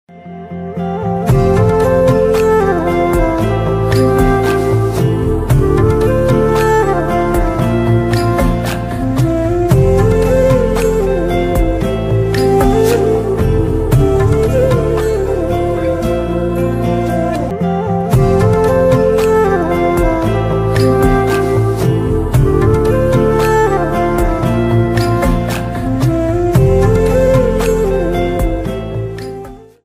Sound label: Flute